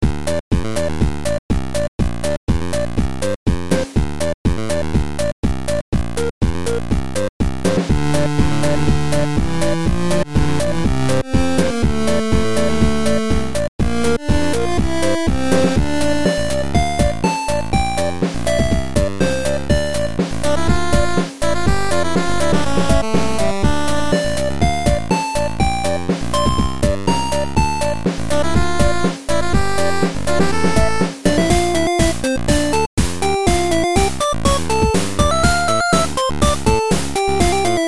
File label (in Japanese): 地下ジャングル